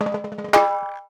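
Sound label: Cha Cha Cha Fills; Spectrasonics; Supreme Beats; African Contemporary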